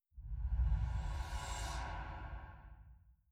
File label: Woosh